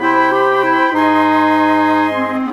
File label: Winds, Orchestral, Rock Pop; Sonic Foundry (Sony Creative Software); Orchestral Series VOL-4; Rock and Pop; Rock-Pop 04